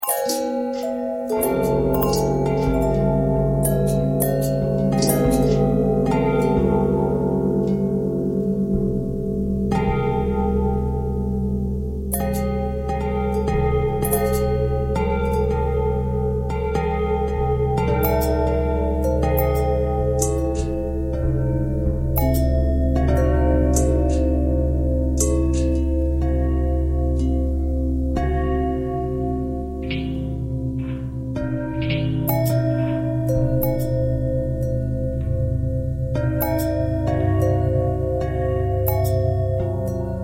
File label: bell long bell thread pentatonic